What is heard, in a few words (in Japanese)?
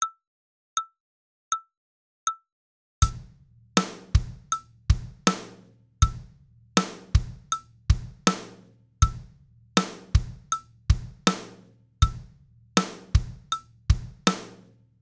4ビート